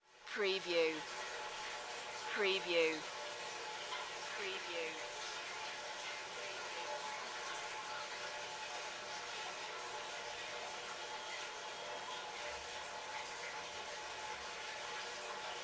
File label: Pipe Flow Through